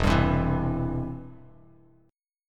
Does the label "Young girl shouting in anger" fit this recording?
No